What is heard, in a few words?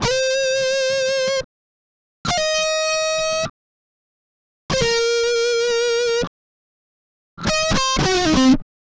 Guitar Licks; Best Service; ProSamples vol.17; AKAI